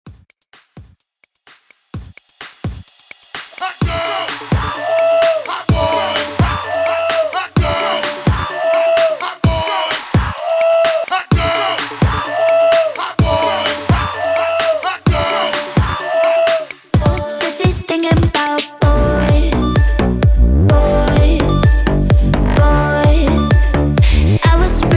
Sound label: HYPE REDRUM 128 BPM